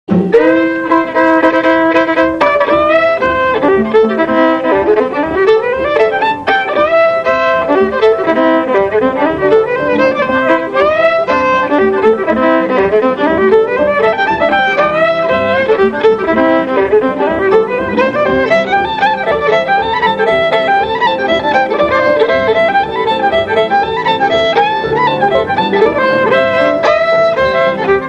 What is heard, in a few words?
Katydid